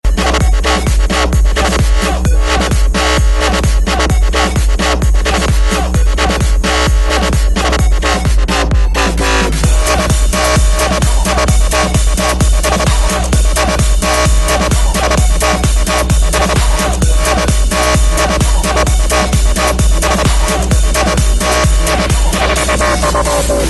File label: Techno Remix